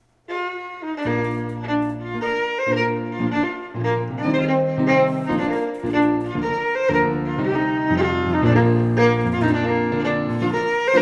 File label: Strathspey, FIDDLE; John Macglashan col 1796; The Jink & Diddle School of Scottish Fiddling